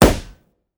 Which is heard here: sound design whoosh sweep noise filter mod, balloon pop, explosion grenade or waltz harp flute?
balloon pop